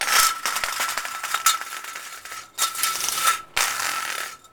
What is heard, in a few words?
Window Blind Noises